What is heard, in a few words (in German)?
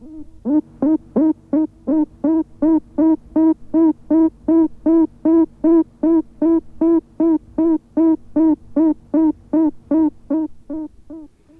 Quaken